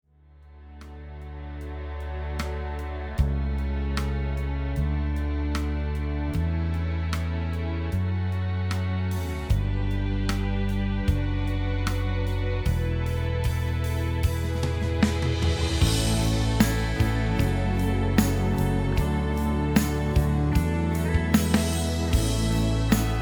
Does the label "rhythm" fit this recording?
Yes